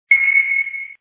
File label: sonic pulse; The Henry Stickmin Collection